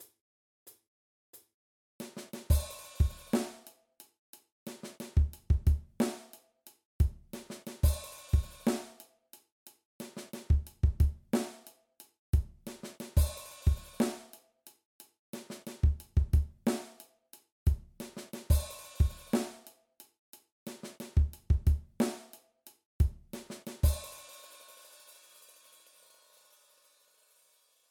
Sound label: Groove